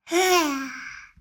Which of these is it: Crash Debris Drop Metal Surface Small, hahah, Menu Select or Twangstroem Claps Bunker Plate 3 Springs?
hahah